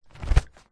hospital paper towel pull from wall dispenser single